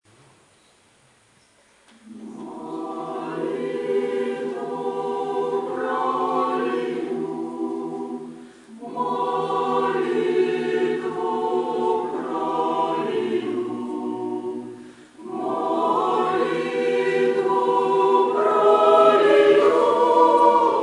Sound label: choir